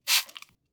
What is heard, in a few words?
cleaning spray single; soundscrate